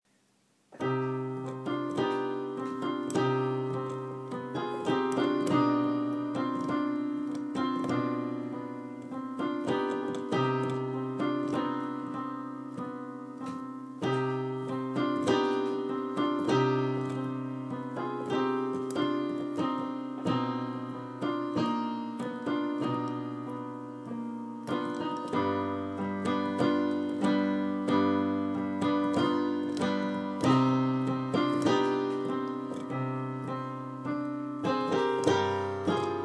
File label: improvisation